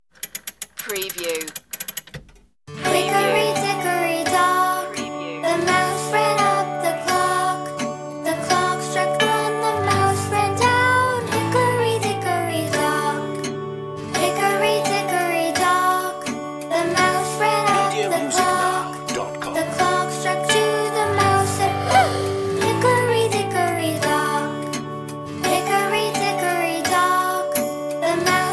hickory dickory dock child solo vocal alt

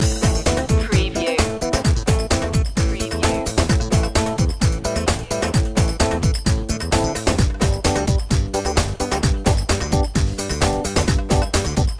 egyptian dance loop

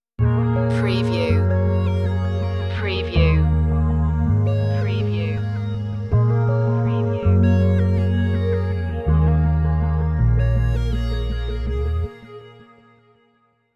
oriental stinger

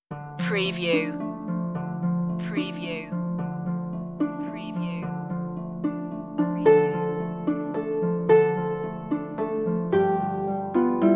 medieval less bowed instruments

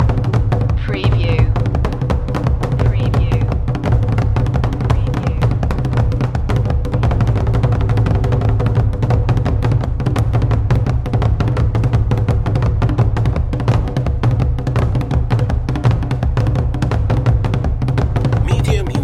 african drums kuchanganyuikiwa loop

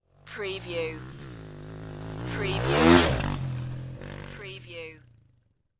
Motorcycle Dirt Bike Driving Off Jump

